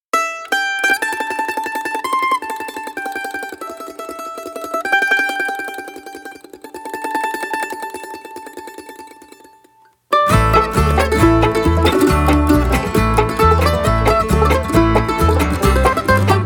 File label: Instrumental